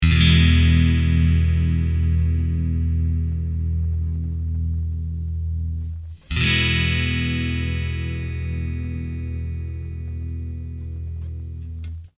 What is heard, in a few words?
clean middle coil; smoke stack ii; axetec